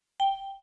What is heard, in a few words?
chimes (G -1oct)